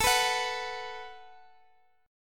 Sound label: Gb Minor Double Flat 5th